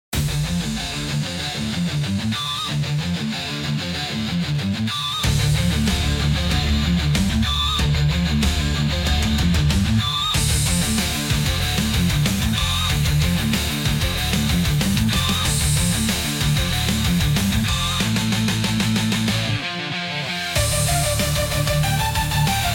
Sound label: hard rock; musique classique